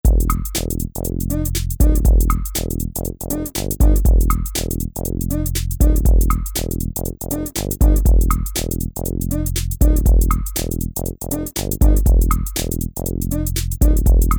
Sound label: Funk